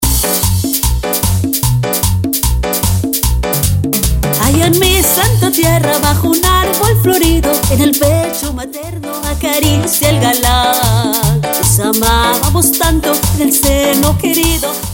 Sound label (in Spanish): Intro Acapela 150 Bpm